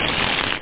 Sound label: SHUFFLE